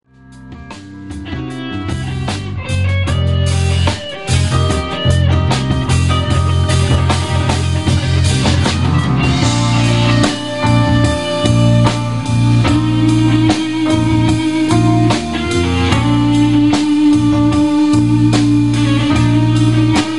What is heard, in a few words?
Child Guitar